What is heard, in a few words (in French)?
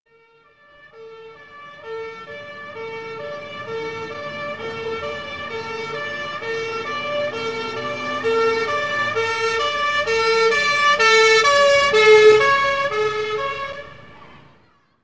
Passage d'un car de police (route); Ambiance et bruitage; Sonothиque (CD4); Radio France